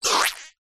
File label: cartoon forced squeeze squelch